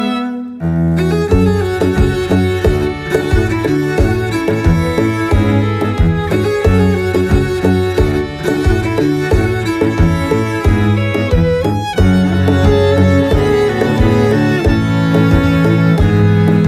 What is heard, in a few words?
violin cello cover ringtone